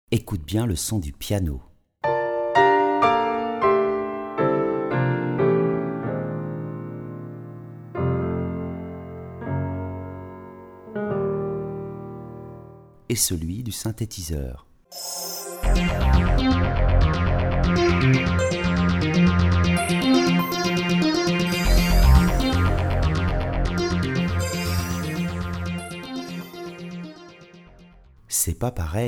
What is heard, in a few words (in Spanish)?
Piano vs Sintetizador